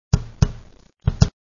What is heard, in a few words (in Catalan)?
bombo